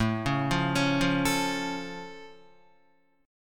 A Augmented A down Guitar; arpeggiated; JGuitar

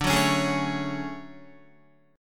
D Major Sharp 11th, down Guitar; strummed; JGuitar

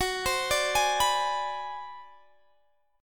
Ab 7th Sharp 9th Flat 5th Gb, chord, guitar

